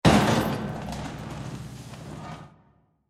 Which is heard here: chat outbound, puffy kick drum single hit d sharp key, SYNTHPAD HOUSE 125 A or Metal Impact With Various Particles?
Metal Impact With Various Particles